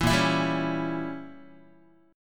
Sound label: A sharp Diminished 7th Guitar; strummed; JGuitar